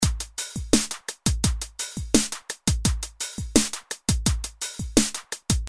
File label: dru